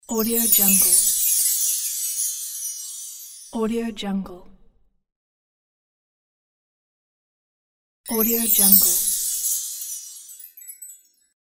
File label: magic wand spell